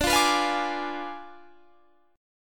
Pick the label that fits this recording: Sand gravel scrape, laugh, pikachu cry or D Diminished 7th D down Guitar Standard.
D Diminished 7th D down Guitar Standard